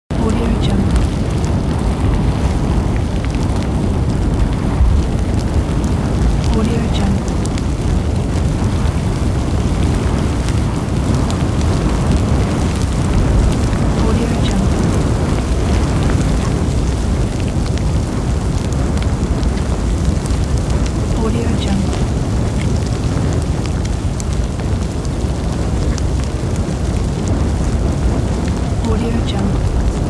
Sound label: fire